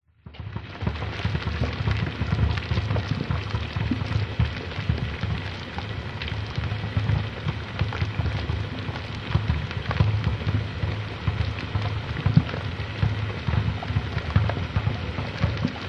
horror cauldron witches boil bubble

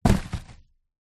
impact cardboard box

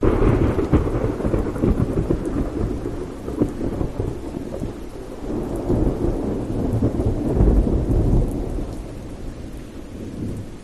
rain in nature rain light with thunder; silverplatter audio

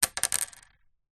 foley button clothes down on table

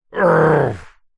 human male grumpy angry frustrated growl; zapsplat

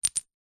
glass small disc set down concrete

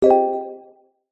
multimedia notification mallet synth dreamy

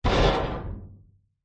impacts metal panel large hit loose rattle; zapsplat

